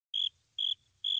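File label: ambience solo cricket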